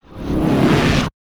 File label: Monster attack; Yu-Gi-Oh! Master Duel